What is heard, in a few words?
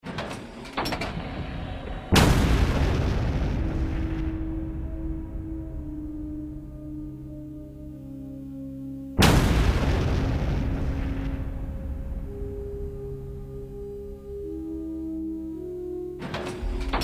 Artillery, cannon sound fx